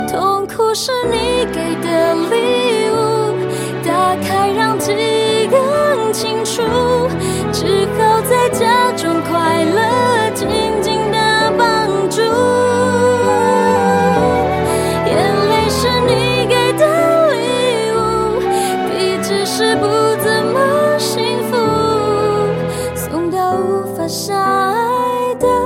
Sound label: chinese song